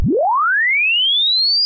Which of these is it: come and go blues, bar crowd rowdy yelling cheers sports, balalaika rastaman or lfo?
lfo